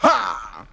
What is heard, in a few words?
ha; Voice; ooh ahh